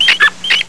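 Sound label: squeak, rat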